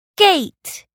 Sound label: gate